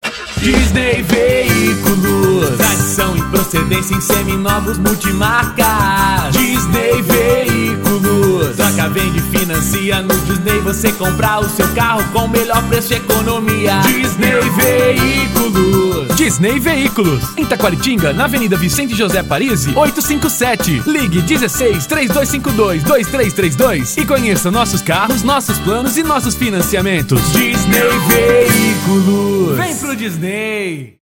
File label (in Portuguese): jingles